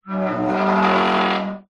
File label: household metal squeak groan gate open; zapsplat